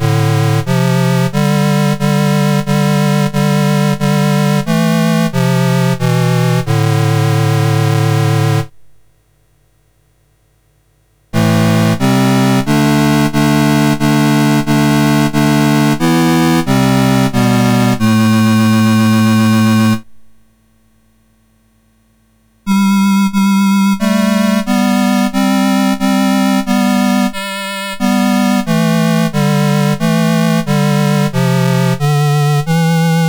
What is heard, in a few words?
Organum, MOS6581R3